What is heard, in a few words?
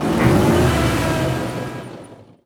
avenger start; nod